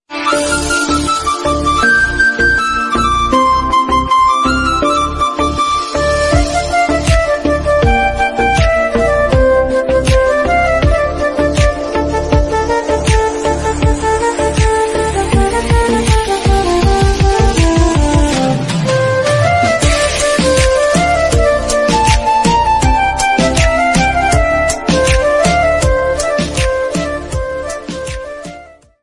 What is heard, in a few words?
Moto New Instrumental Ringtone; ringtones